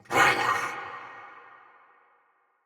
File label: pain, soldier; Barks Battle; Mendicant